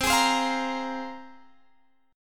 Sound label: C 7th Suspended 4th Sharp 5th, Guitar, Open G